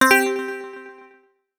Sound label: Lead, 8 bits; Game Sound Solutions - 8 bits Elements; Buttons Colletions Demo